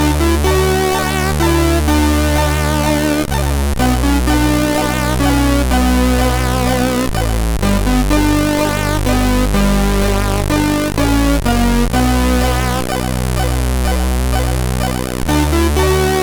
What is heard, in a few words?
sid CSG8580; HVSC; Ausbaufaehig